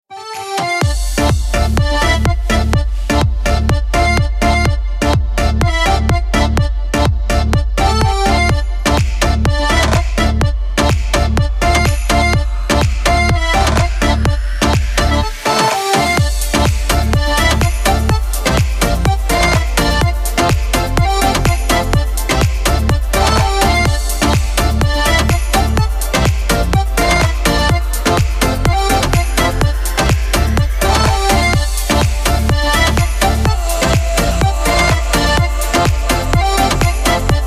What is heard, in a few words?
Accordion